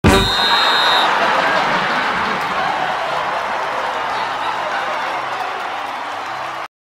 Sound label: Tug of War (Rope Falls); Asterix at the Olympic Games